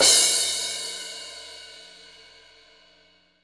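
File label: CYM CRA, cymbal crash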